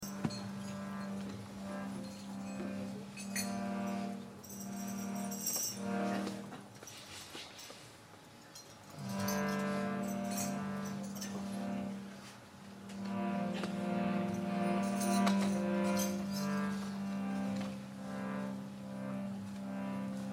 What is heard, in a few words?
Keertan; UK Smagam 2010